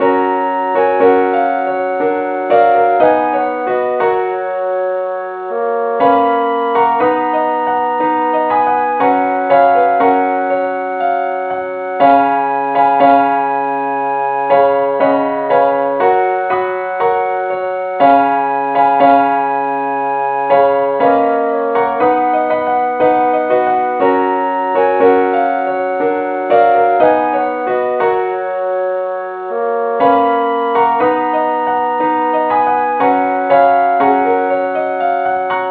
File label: keyboard key B